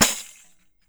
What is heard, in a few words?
PANDEIRO, Brazil Drum Perc; Drums&Percussion; E-MU Producer Series Vol. 7 – Old World Instruments